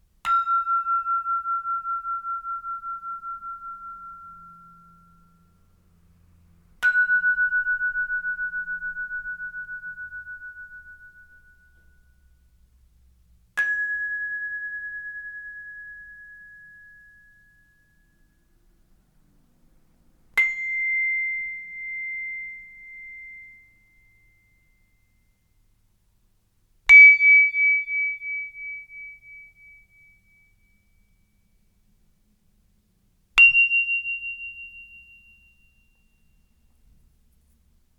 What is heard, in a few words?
Sopranos